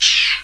CHOO

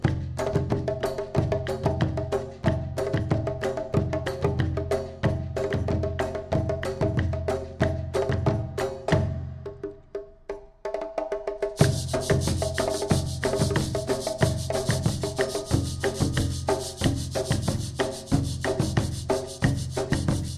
drum Song; World Music

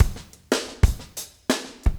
motown 120, loops 120bpm

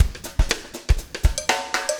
breakout 120, 120bpm, loops

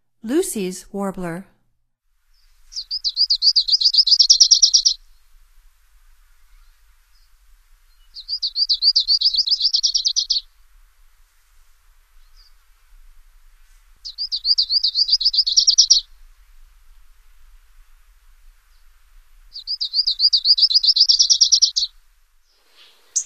Lucys Warbler, Songs of Birds of North America; Stokes

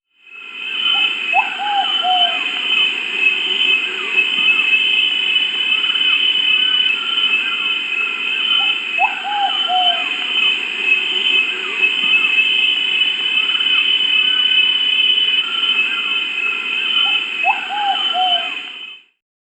Ruddy Pigeon (Columba subvinacea), Peru; S. America

